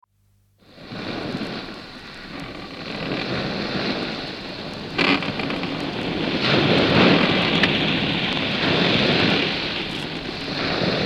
Sailing S, Sailing Ship, creaking ship with heavy waves (Recorded on board vessel); from 78 disc; Boat; BBC